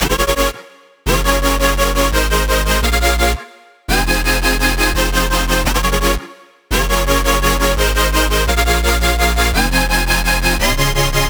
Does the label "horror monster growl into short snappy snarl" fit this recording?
No